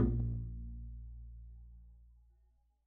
pizz bb1, double bass; dsmolken

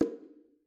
Bongo Hit Muted, Struck Membranophones

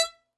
Harmonica Hohner Super64 Normal release E4; Aerophones; Releases; Free Aerophones

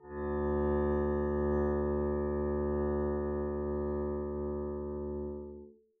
arco d2 p up, double bass; dsmolken

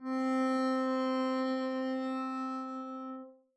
arco c4 p up, double bass; dsmolken

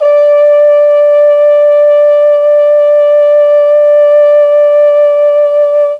D3 TROMBONE, mellotron; CHMB TRMBONE

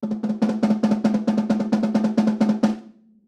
snare no snare roll ff

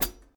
Hat Pedal Softest; hydrogen drum samples

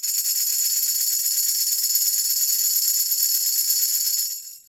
tamb roll Fast, Tambourine; Idiophones; Struck Idiophones; Tambourine 4

